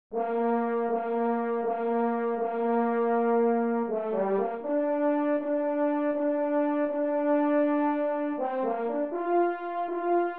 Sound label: horn